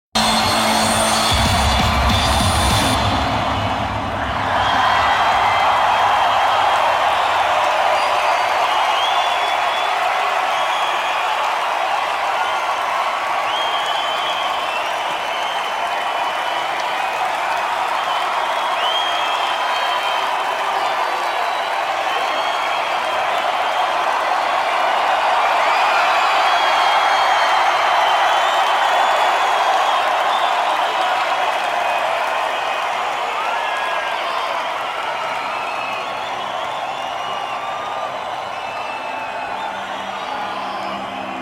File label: Piano